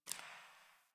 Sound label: Derelict Interior Water Drip; Warframe; Orokin Derelict